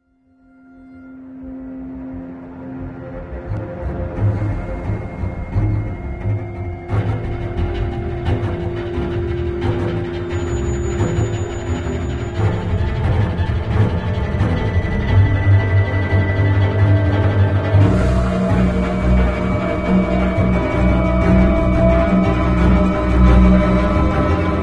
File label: Outro Crash